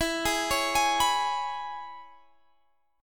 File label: E 6th, down, Guitar, Standard; arpeggiated; capo 9